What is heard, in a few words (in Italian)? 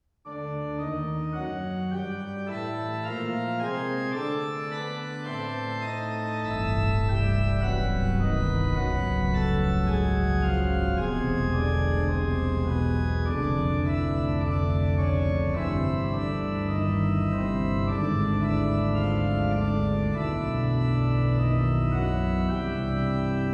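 canoni, organist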